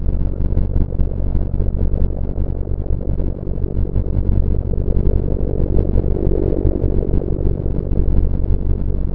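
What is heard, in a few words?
cave tunnel ambience; hl2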